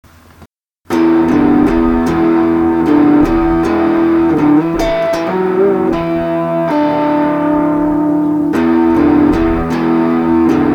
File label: guitar